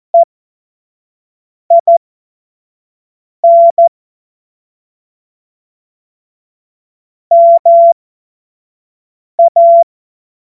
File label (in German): Witz, Morsekurs, 25 F70; Witze